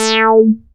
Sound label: ROLAND JX8P, A3; Big Fish Audio - First Call Vintage Keyboards